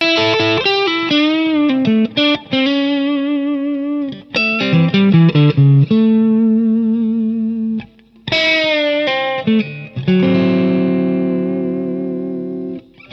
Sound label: Platinum Mid, guitar pickups